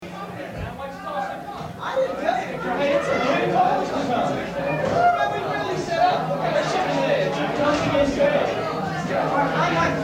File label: After Set Crowd Noise; Sigma Phi Epsilon